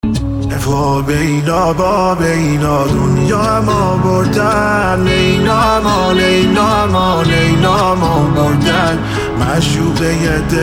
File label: music sad; آهنگ غمگین گریه آور مخصوص اینستا